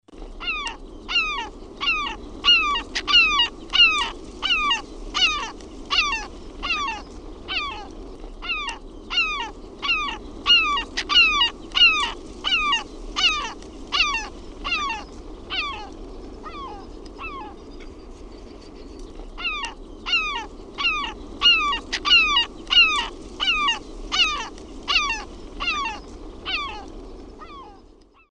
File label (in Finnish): SELKALOKKI; OMAT LINTUAANITYKSET